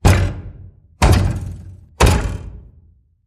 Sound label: Aggressive Knocks